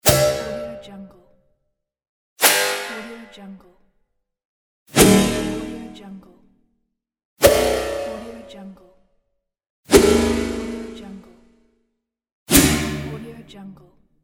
tonal swish; transitions and movement

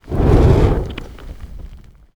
fire ball on chain; پرتابه آتشین

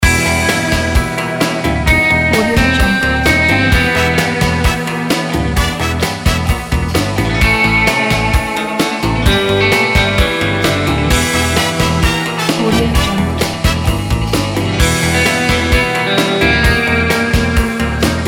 70s crime movies loop; موسیقی بی کلام; فیلم جنایی

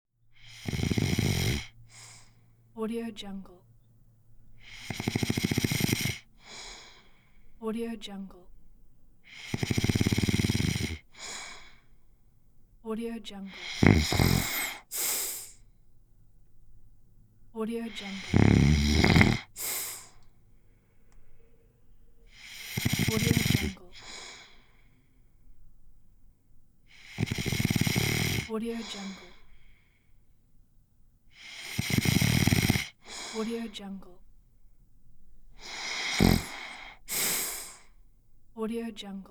snoring; خرپف کردن مرد

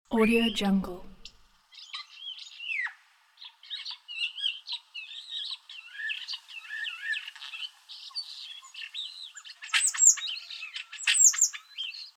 starling singing; آواز سار; nature sounds

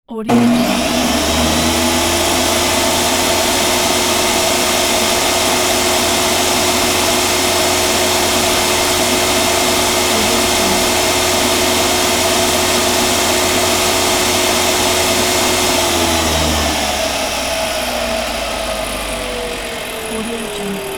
table saw; urban sounds

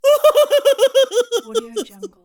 silly female character laugh; cartoon

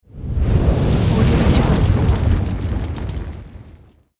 dragon fire whoosh with fire crackle

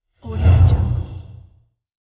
whoosh; transitions and movement

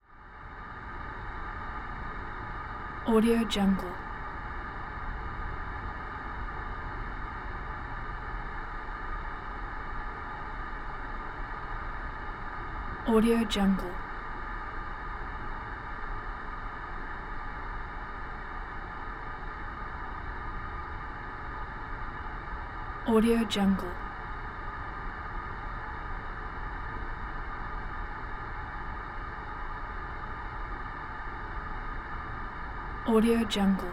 intake exhaust building loading; industrial sounds; صدای کار کردن موتور

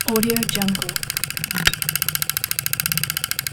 synchronizer spin 35mm film rotating with break; 35 میلی متری; interface sounds

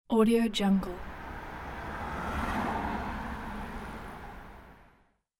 bike pass by

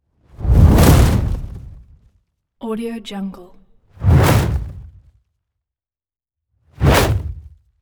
whooshes; transitions and movement

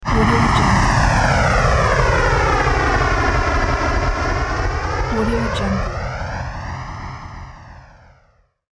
plane take off

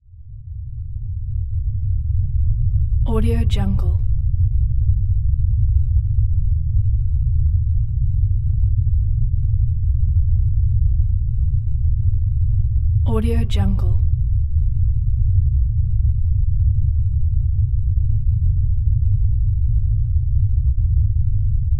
earthquake rumble; غرش زلزله

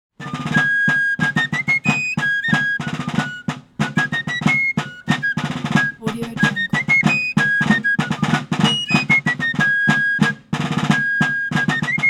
colonial american traditional fife drum march; transitions and movement

